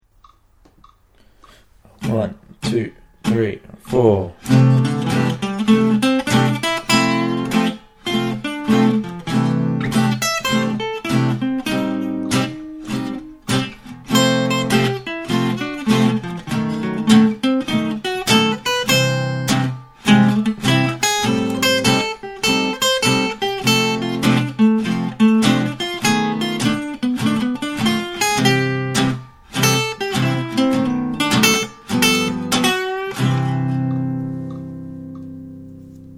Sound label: blues track